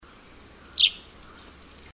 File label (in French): pipit de richard